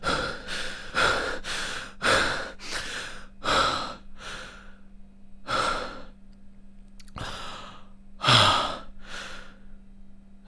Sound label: player out of breath